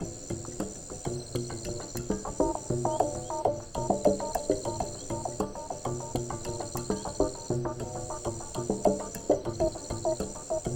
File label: Background Loop